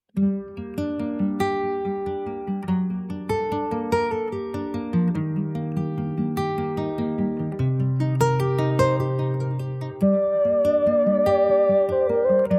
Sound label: acoustic